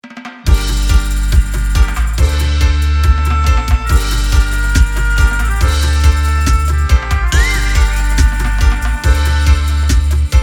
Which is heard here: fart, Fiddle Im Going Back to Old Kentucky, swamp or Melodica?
Melodica